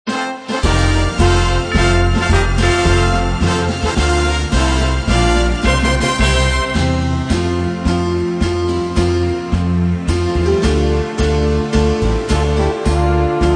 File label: Instrumental Hymns